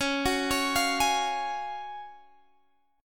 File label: F sharp Major 7th Suspended 2nd C sharp